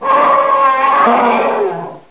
HELI SHOT; MOMJR

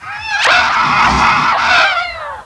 SMALL EXP; DINOSAUR

